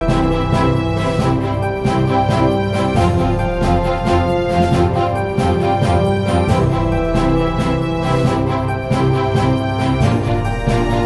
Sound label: music game; Princess Goldblade And The Dangerous Water